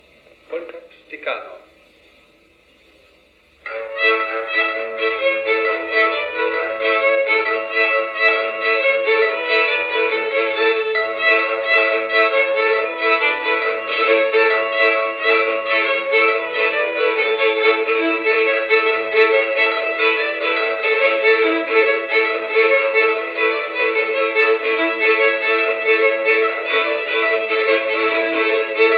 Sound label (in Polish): instrumentalny dygacz; polka pstykano